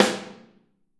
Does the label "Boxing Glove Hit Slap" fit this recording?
No